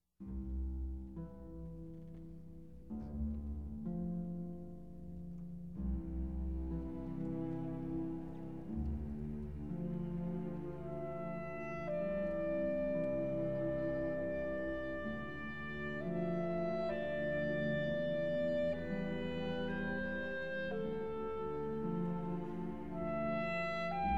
Concerto for Clarinet and String Orchestra (With Piano & Harp)